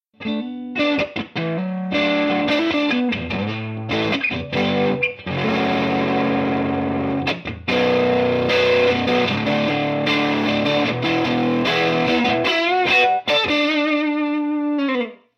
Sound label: overdriven